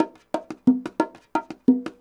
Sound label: bongos 120 bpm, latin; Sound Effect Library